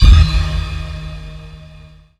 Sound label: dance stab; E-MU Formula 4000 Series Vol. 2; Dance Hits